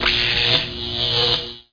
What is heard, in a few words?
shock